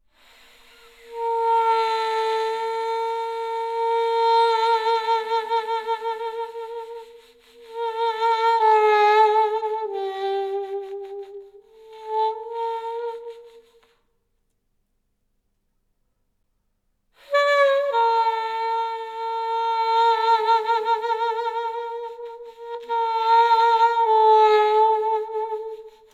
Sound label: Saxophone Solo